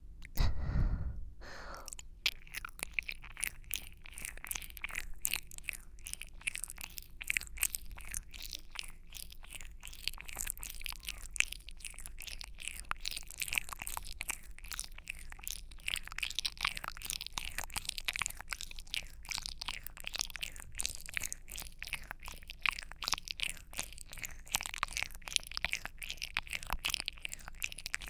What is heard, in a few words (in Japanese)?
耳回りぞりぞりコース